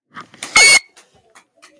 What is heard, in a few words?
ultimate scream